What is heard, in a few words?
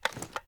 ar akilo47 drum reload grab mag; weapons; viper